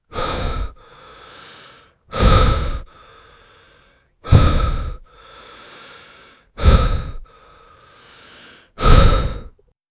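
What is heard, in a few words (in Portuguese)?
respiração bem pesada de um, breathing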